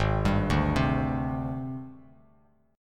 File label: G sharp Major 7th Flat 5th Bass Standard; 4,10,0,5; JGuitar